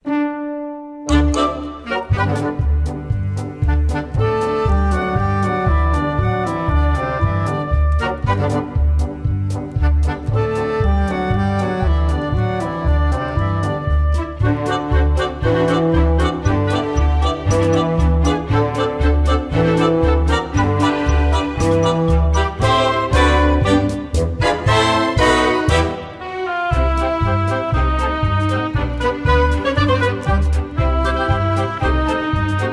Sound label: Impossible Backing